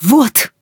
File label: fire, human woman fight; Stalker Anomaly